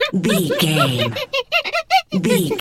hyena laugh

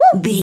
voice fun small character emote happy

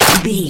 gun chamber jammed

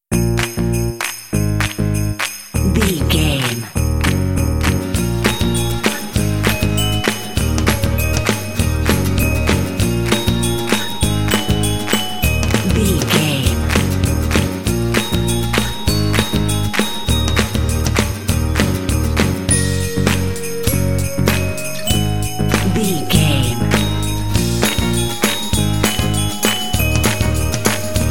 indie pop holiday fun

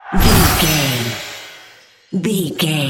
spell harness magic

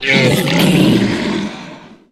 troll monster hurt pain long

